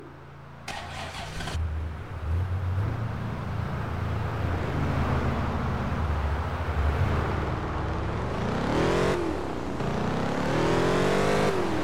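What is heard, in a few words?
ford mustang gt coupe, car; TDU-1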